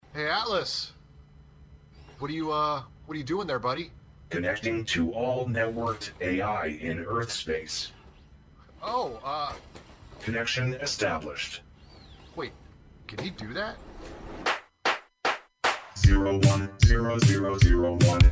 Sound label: noise; Virtual Hard Mondays Session 38